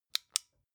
flashlight off

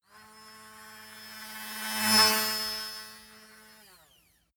fly by buzzing drone

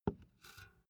filled can put down

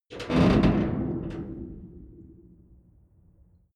metal tank creak single

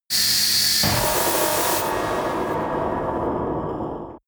big shock

